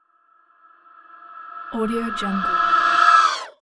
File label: creature wraith hit reverb; smartsoundfx